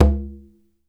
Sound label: Conga Low Ring; One Shots; Drums; Ableton Live 12 Lite; Core Library